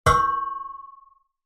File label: collision to border; turtle graphics game; Avaruuspeli